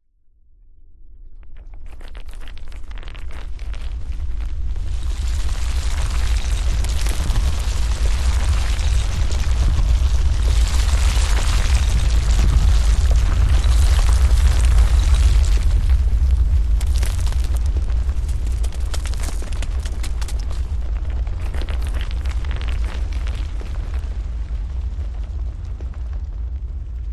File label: nature earthquake rumble rocks movement landslide